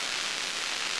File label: grenade idle